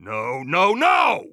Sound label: scared emote; vo; Counter Strike Model Pack; CS:GO; phoenix coop